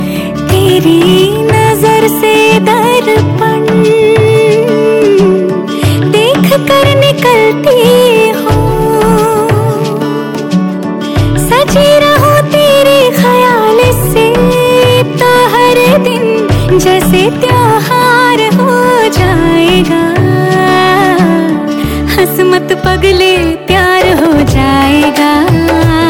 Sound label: Bollywood Ringtone